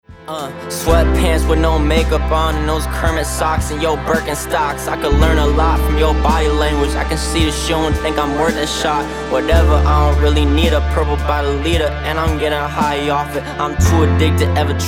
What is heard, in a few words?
HIP-HOP